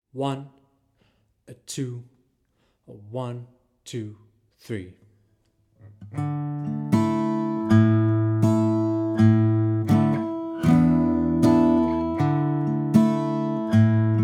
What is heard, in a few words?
Guitar Steady; The Miller of Drone